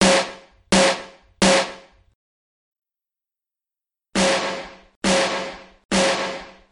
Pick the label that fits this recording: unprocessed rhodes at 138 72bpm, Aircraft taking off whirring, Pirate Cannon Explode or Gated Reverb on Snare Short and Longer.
Gated Reverb on Snare Short and Longer